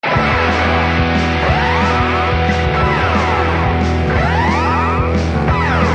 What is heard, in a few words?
Fast Anxiety